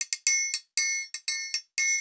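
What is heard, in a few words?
TRIAN, triangle, Modern Percussion Loops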